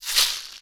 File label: box rattle; Kenya Box Rattle